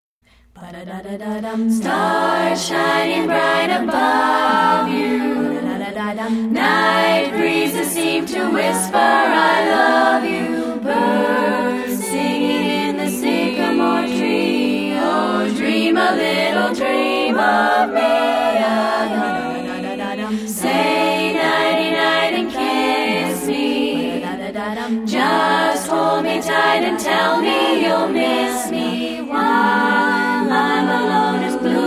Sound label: acapella